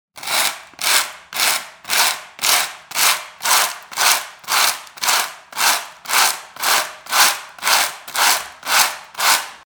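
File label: sandpaper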